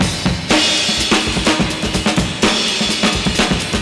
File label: Soul Drums; Korg ARP Odyssey; Korg ARP Odyssey sample pack